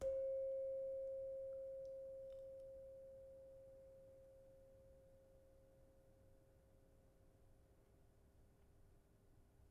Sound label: Saron soft, gamelan